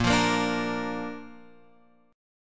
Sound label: A sharp Major, Guitar, Open F; strummed; JGuitar